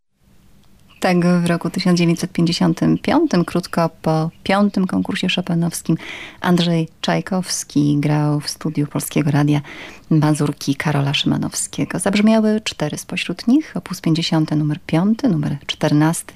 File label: szymanowski mazurka, pianist